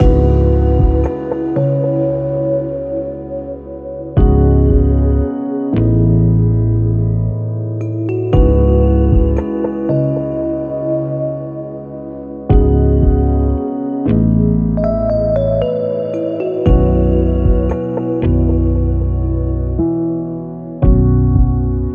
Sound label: music chill loop; Necrobarista Final Pour